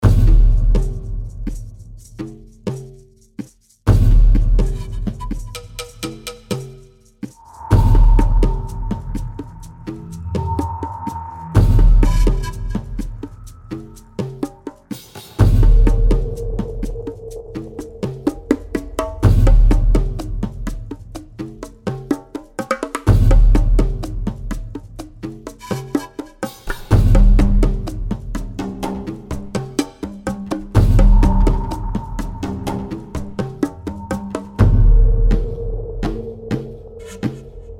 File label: action drums ambient 125bpm; crysis warhead